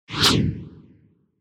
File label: Whoosh